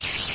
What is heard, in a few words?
spider; mob